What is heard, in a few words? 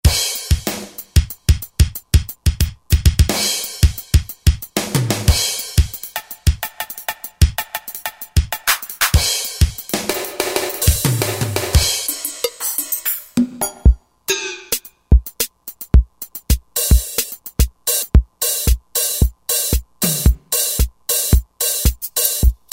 Drum Set, Roland JD-990; Factory Patches